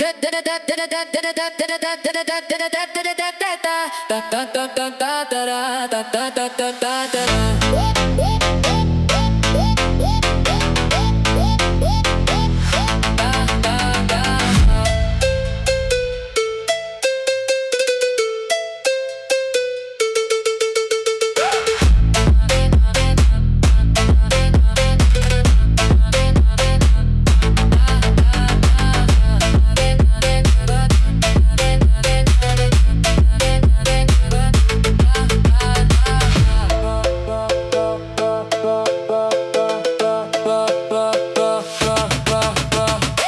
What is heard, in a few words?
automotivo drop bakuon